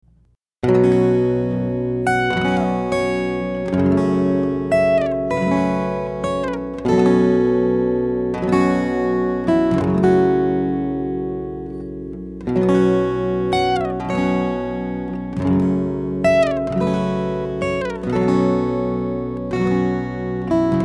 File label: Soft Surf loop